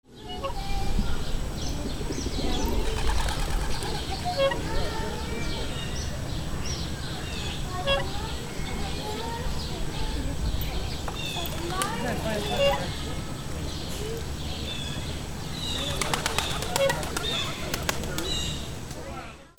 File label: BIRDS